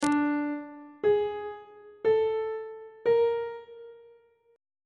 c double sharp dim5 perf5 aug5 intervals on treble clef